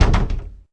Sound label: Mimic walk; Seer; GamigoNA